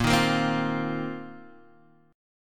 A sharp Suspended 2nd Suspended 4th A sharp down Guitar Half Step Down